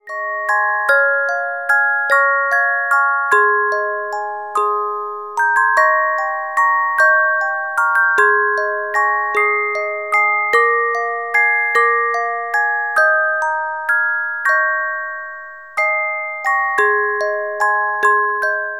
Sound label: Carillon Music Box Melodies